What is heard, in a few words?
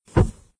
Scorpion Spawn; doodle jump 2; android